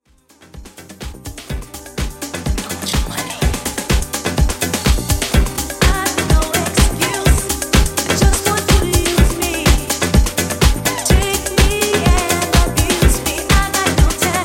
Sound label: 80s Extended, 125 bpm